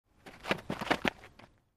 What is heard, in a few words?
Basball FS Mvmnt, baseball; baseball fx & games